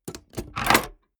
mechanics small movement

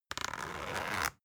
zipper soft

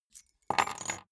key ring small place table

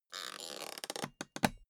suitcase close latch lock really slow

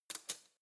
cutlery spoon drawer place soft

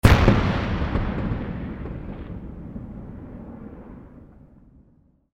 explosion

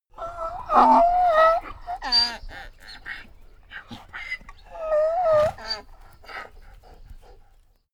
donkey cry complain

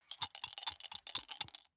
small four rattle in hand closed

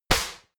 stylized fist punch

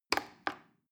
plastic button little click regular slow

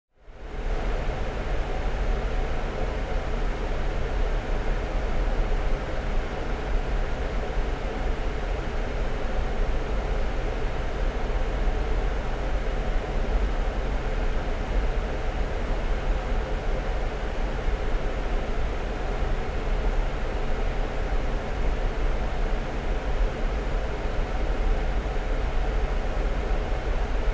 aircraft cockpit inside

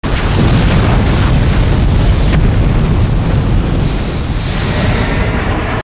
secondary burst explosion

